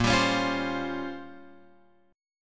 A sharp Minor 6th Add 9th A sharp down Guitar Open F, chord; strummed